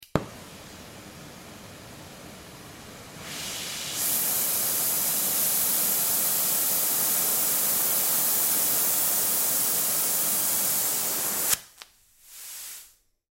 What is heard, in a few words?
Blow Torch On Off, Blow Torch On Run Off Flame Gas; Welder; Industry; Welders; Machines; Blastwave